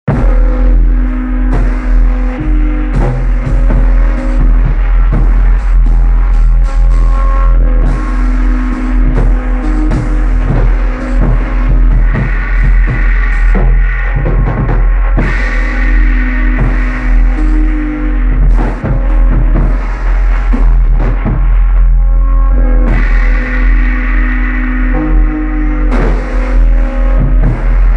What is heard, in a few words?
Car Horn Choir